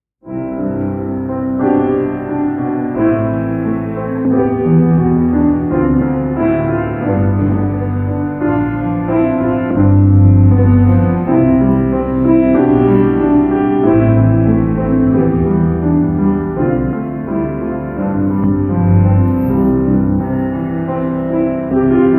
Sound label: instrumental